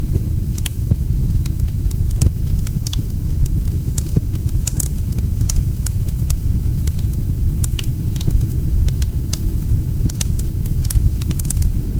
ambience fireplace

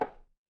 impact wood knock

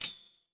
feedback success

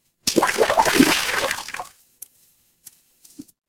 water splash

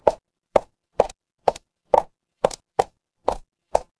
footsteps heels

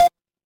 retro game coin

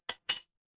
glass ceramic

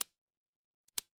office pen

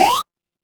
retro game jump

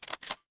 office camera

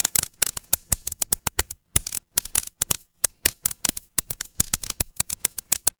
fire electric crackle

